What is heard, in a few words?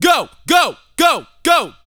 VOCAL, R&B Dance, HIP-HOP